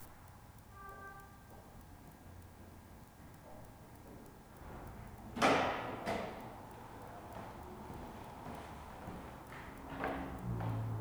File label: foyer fashion building toronto